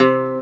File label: GTR SOFT C2, Celtic Flavours; USB Soundscan vol.42; AKAI